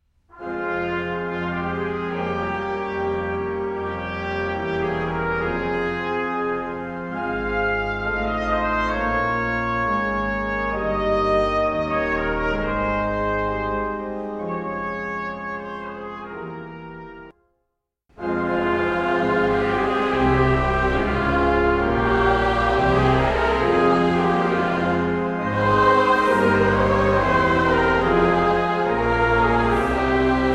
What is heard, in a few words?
Hymn